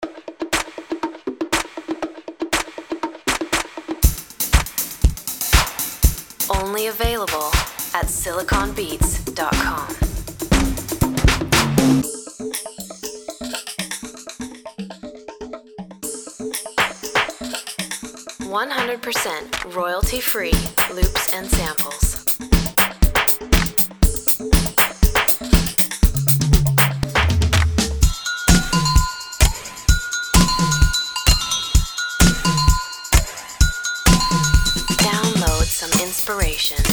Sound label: disco drums voice duck